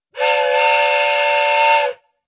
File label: Train Whistle